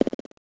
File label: step; quake